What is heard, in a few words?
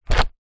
paper binder large down on surface